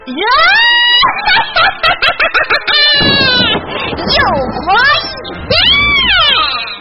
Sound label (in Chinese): 提示音; 又活一天提示音